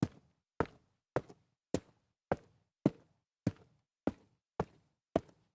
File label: walk up stairs concrete, foley